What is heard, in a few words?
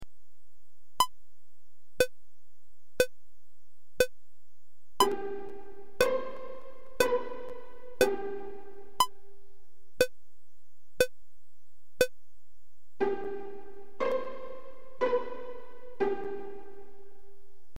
Minim Example, guitar; 4/2